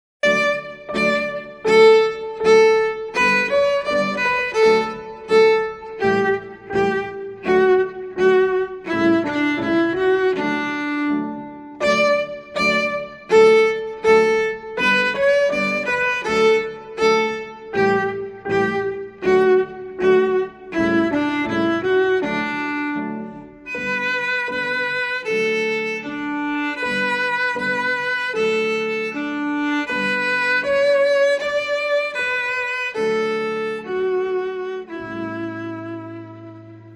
Allegro; S. Suzuki

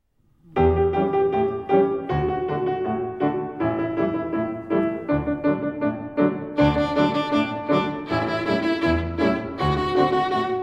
in D Major; The Practice Chain

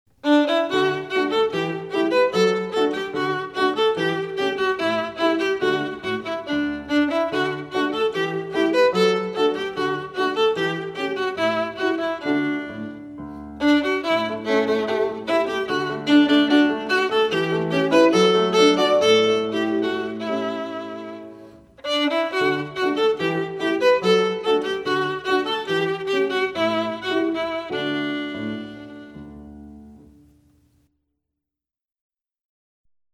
Suzuki Violin Method, Allegretto

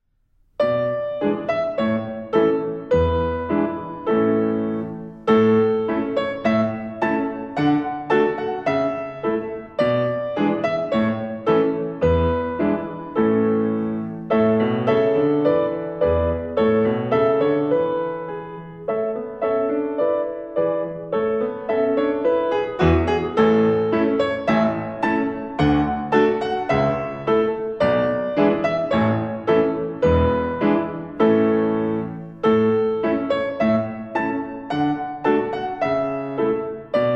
May Song Performance Piano

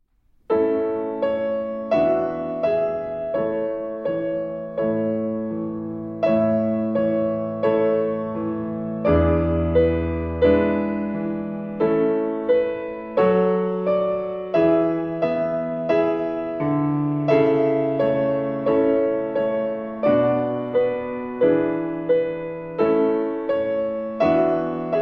Medium Practice Tempo; Lightly Row

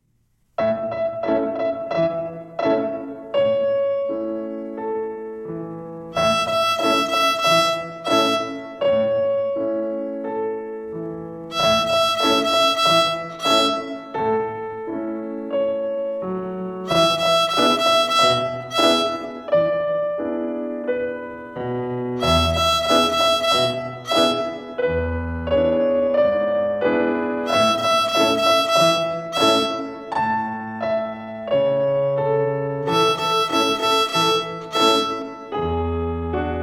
Rhythm on E

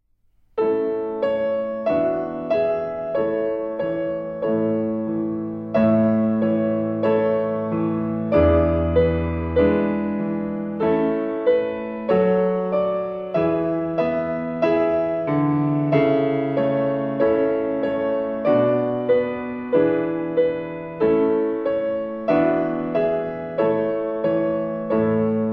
Lightly Row in Performance Tempo Piano

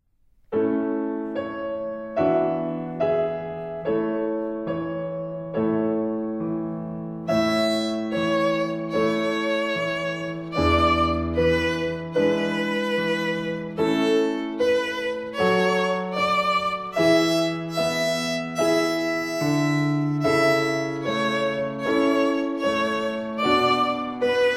Slow Practice Tempo; Lightly Row

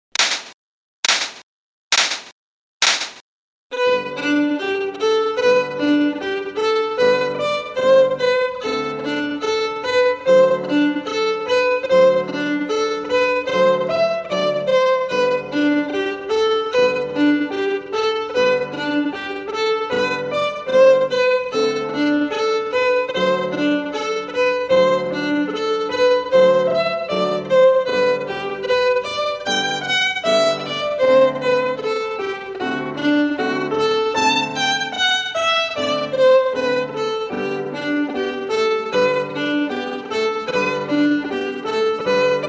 ETUDE VAR 1 LENTO

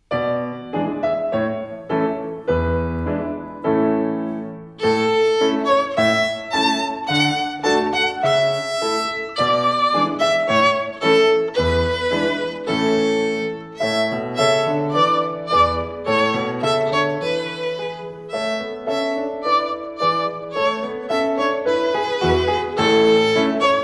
May Song Performance Strings

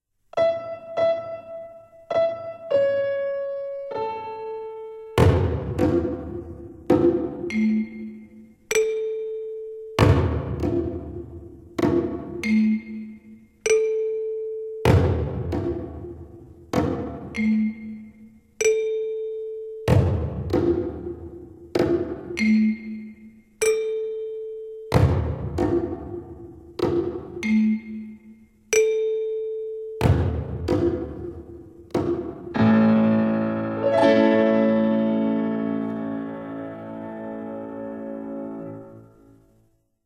The Second Twinkle Rhythm

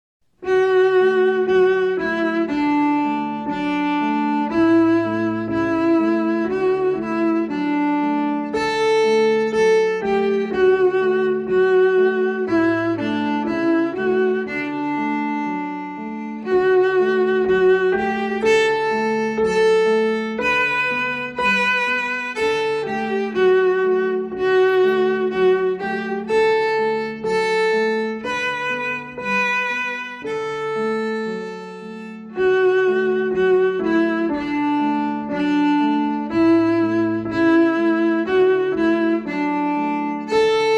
Go Tell Aunt Rhody. Folk Song

